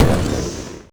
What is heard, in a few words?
fire bomb